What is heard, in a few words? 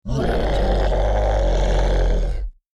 monster growl